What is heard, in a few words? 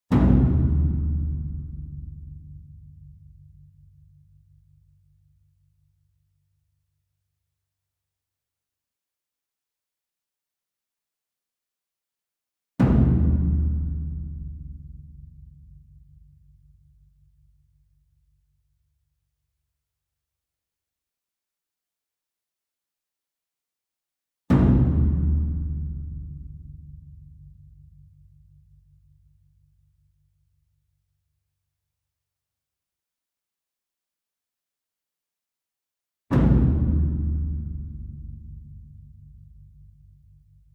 ENSEMBLE DRUM Bass Drum Concert And Taiko Hit Far; boom library; Cinematic Strikes Construction Kit